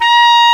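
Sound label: clarinet high, FAIRLIGHT CMI IIX REEDS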